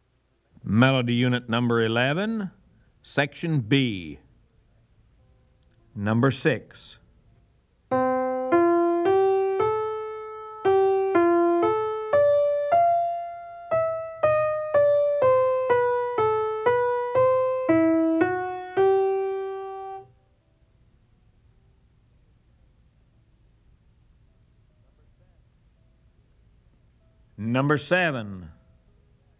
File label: melody, ear training recordings